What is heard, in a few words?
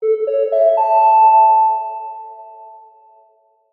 public places annoucement tone reverberant